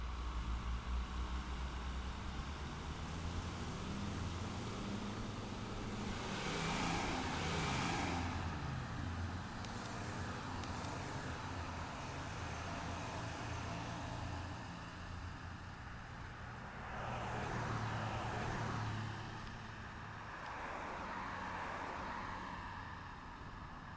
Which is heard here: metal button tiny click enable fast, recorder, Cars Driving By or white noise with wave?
Cars Driving By